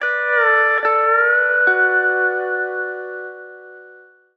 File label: steel before; Soothe